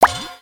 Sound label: Heart Pickup, ui; aronwk collection; ndaudio